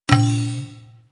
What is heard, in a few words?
percussion